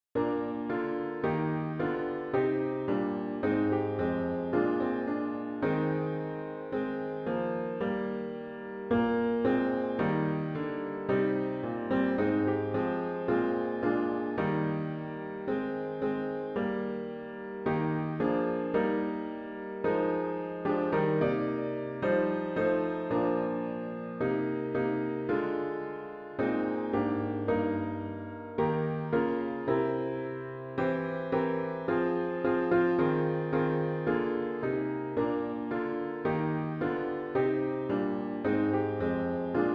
Let Us Break Bread Together piano with Intro; easter sunday; worship service

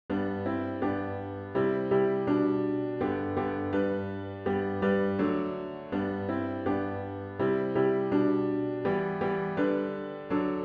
piano only; How Firm a Foundation

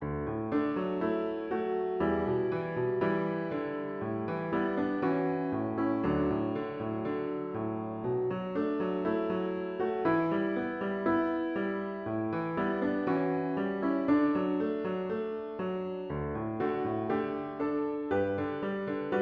piano only; When We Are Living

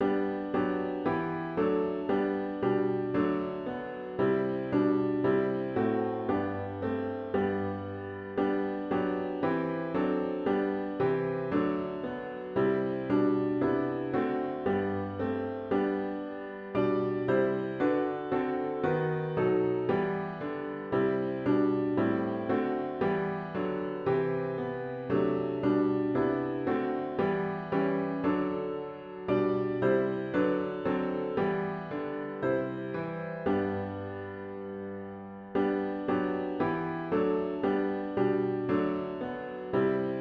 piano only; Gentle Mary Laid Her Child

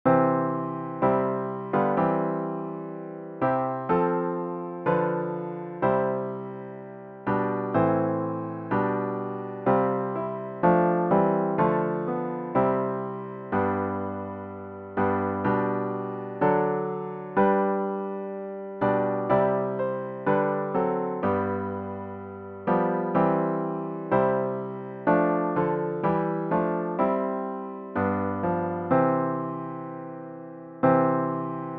piano only; Lift Up Your Heads Ye Mighty Gates

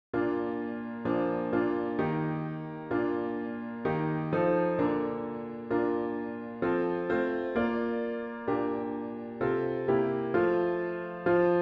piano only; So Let Our Lips and Lives Express

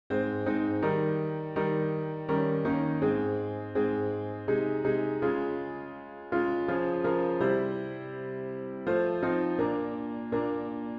piano only; Jesus Calls Us

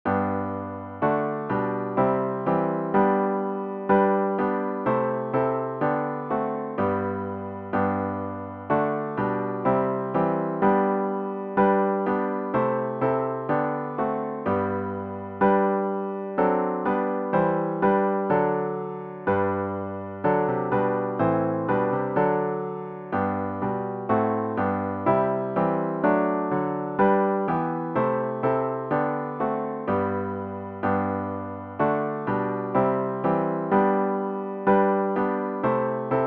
piano only; Come Christians Join to Sing

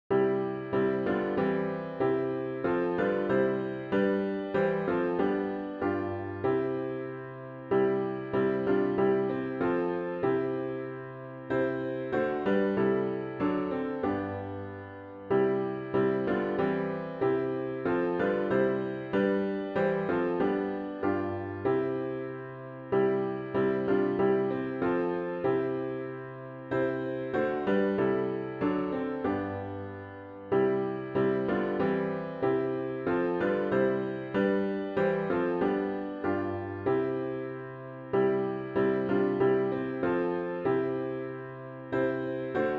Hymn piano w Intro; worship service

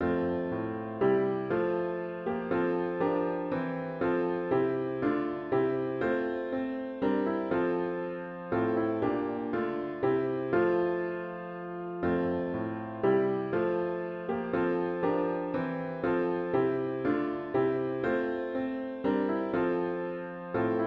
piano only; Come Thou Long Expected Jesus

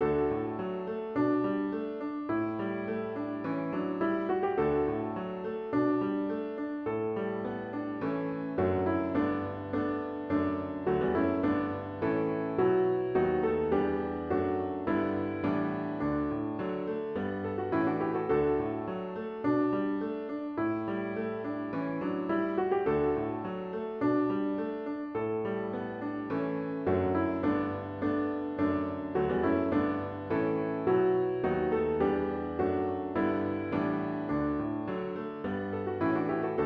piano only

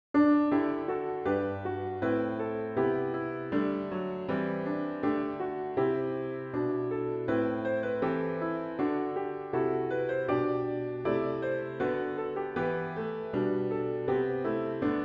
piano only; An Upper Room Did Our Lord Prepare

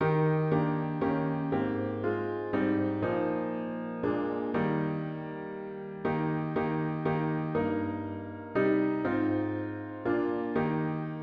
Jesus Thou Joy of Loving Hearts piano with Intro; worship service

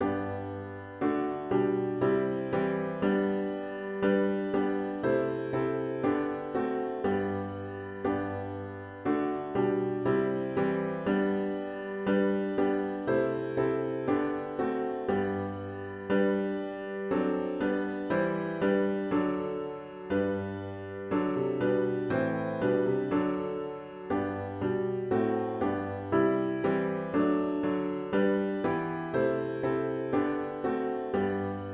piano only; Come Christians Join to Sing

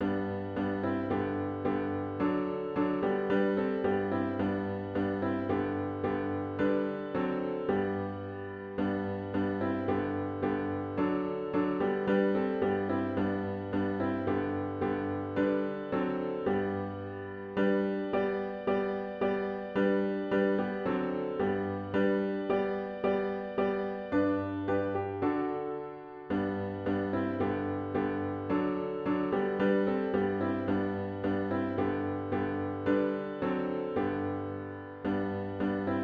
piano only

